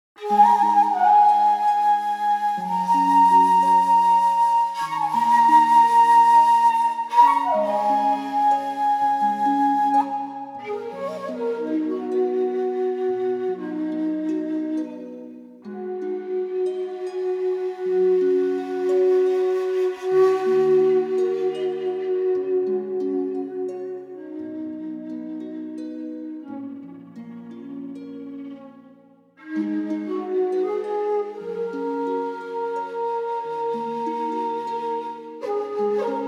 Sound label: Shakuhachi